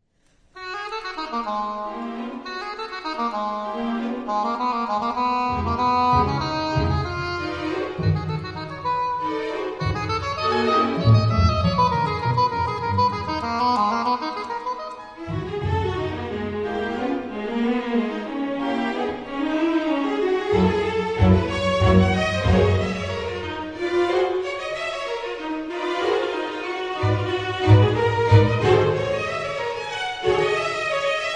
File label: concerto for oboe d'amore and string orchestra